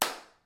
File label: Crunk Clap